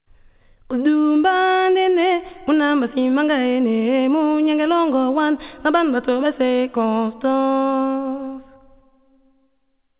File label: vocal